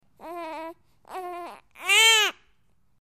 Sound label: Baby Whines Cries Bre